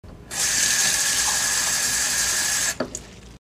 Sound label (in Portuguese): pia, Sons domésticos; pacdv